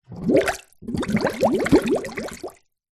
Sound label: nature water bubbles blown into basin very light room reverb